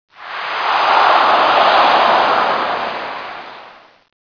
aww

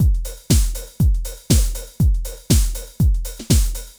BEAT, DANCE DRUMS; MAGIX

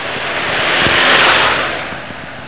AUTO; 999wavs

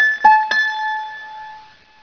TING; BLENDER

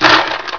BARRIER; WARPATH

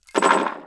CRASH; KRONDOR

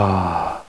AAAH; PEOPLE

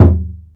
OHTAICO; PERC; cubase

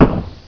ENTER; MCRAFT

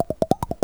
CLICK; SCALA

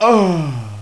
pain; JOSEPHIN

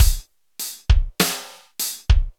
ROCKS, ROCK POP DRUM; MAGIX

